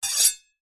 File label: knifes medium scrape blades together